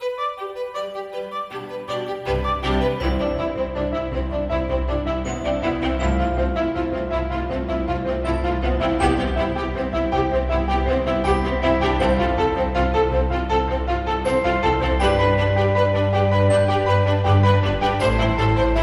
Uplifting Piano

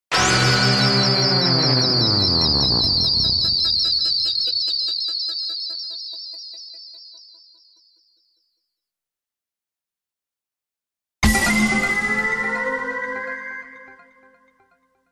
Gritty Stinger

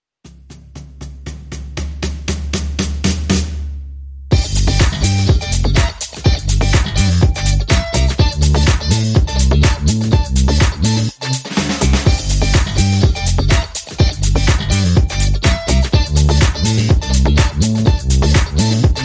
No Vocals

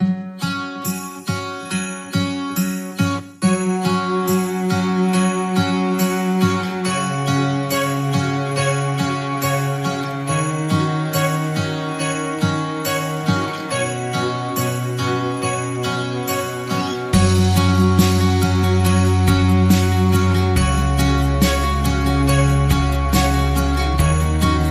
Joyful Acoustic Christmas

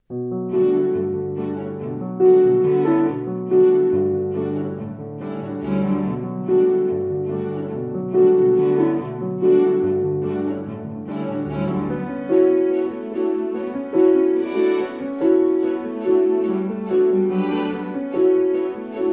With Strings

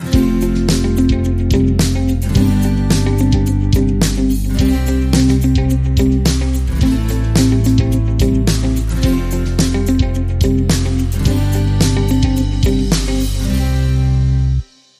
Acoustic Inspiration Underscore

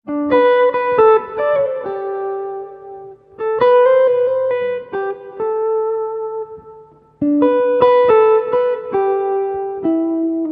Guitar Only

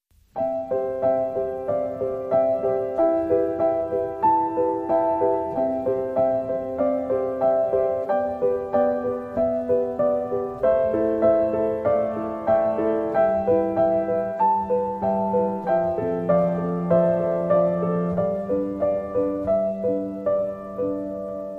Piano & Cello

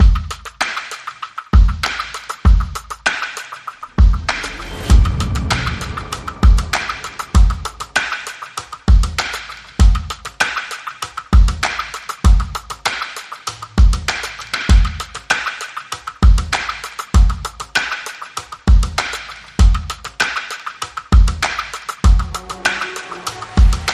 Drums and SFX

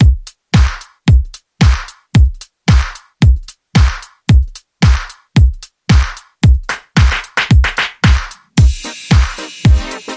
Funk Era Rhythm

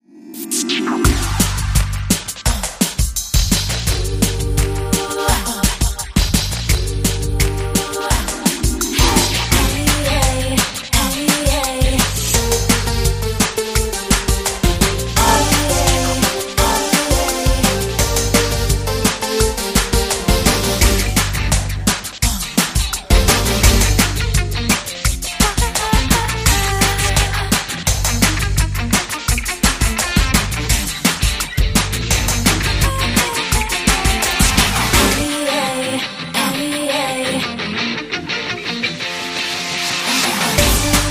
Pop Rock

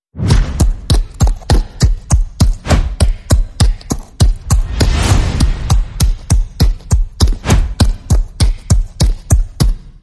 Pegasus Walking Pulse

